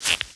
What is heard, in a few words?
Sheep Walk